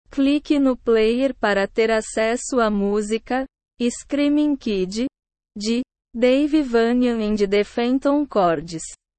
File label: screaming kid